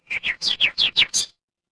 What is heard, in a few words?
animal bird